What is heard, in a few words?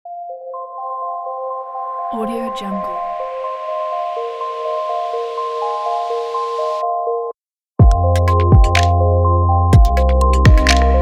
upbeat vlog